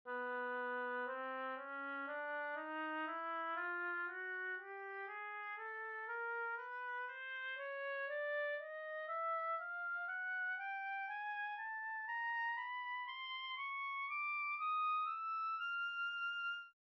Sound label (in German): Oboe; Instrumentenkunde